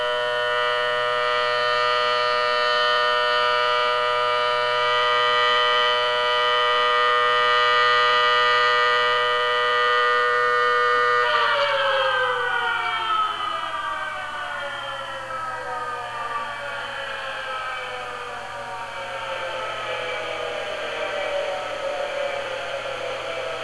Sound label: sirens; paintertown